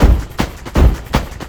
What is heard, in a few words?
LOOP, 160 BPM, Drum & Bass